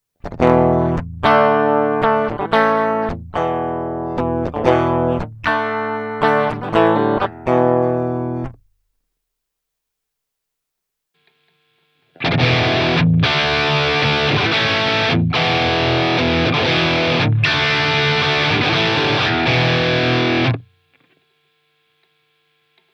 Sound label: scarlett noise test